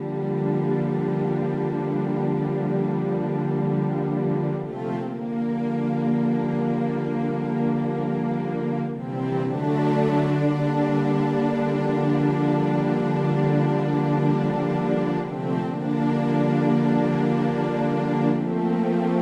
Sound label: STRINGS